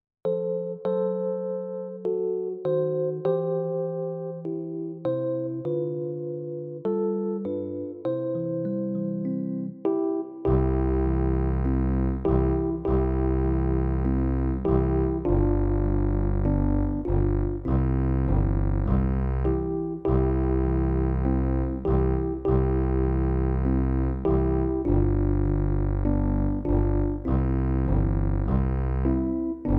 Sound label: Karaoke